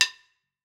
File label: Wood Stick, One Shots Drums